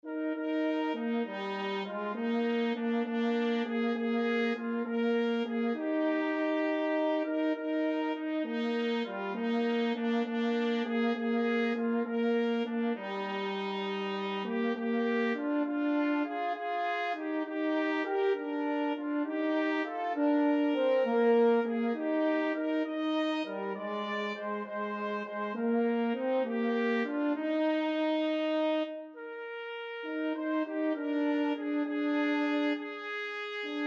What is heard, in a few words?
trumpet french horn duet; faith is the victory